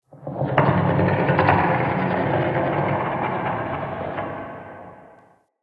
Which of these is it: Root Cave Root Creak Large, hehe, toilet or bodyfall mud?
Root Cave Root Creak Large